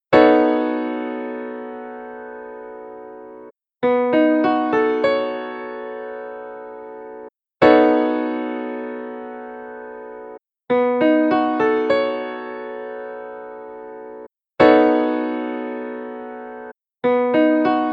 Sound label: B9 Piano chord